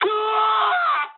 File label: jp aircraft destroyed scream, speech jp